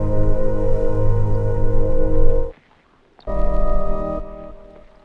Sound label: Orch Sounds; Chillin Out; Sonic Foundry (Sony Creative Software); Stylus Pressure Urban Grooves on Digital Wax